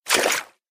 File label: water; hits; frag